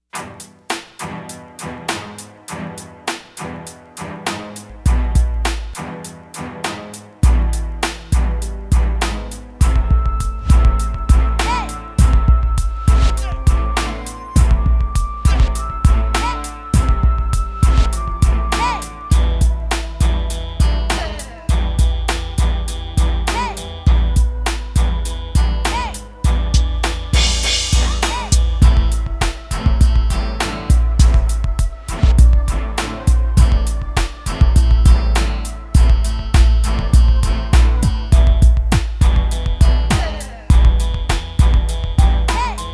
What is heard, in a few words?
Series Outro (Instrumental); Afro Samurai